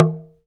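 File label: talk drum, 2G; TALK DRMS; Spectrasonics; Heart of Africa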